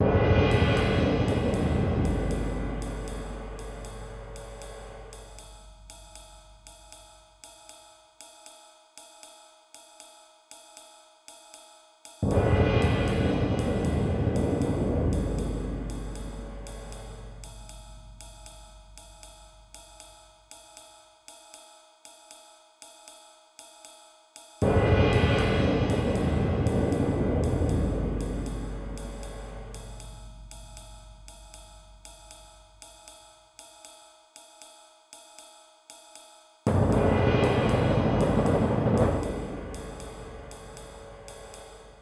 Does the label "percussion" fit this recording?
Yes